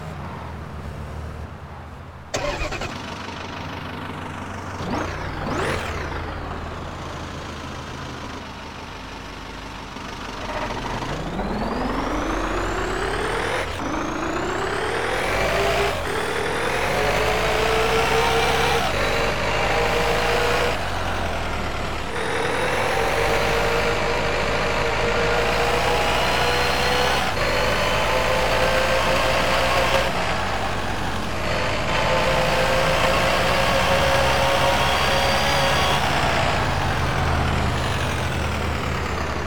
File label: audi a4 2.0 tdi quattro avant; 2013; TDU-1